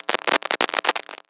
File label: Technology Electronic Electric Arc Single